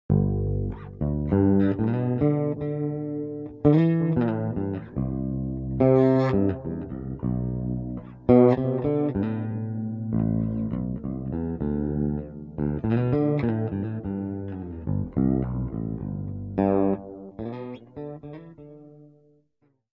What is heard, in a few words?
Chorus Bass Delay; Dan Dean